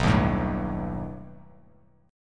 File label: B Augmented 7th B down Bass Standard; B+7; strummed; JGuitar